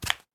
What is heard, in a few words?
bare land; Golden Crayon Sounds